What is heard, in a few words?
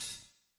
hihat; pasific; Pop